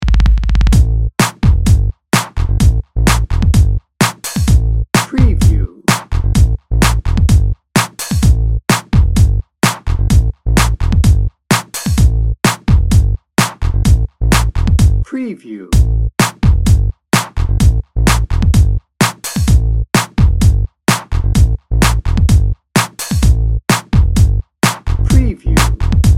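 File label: bouncy beats drum and bass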